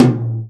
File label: tom, Real Drum Kit, jungle kit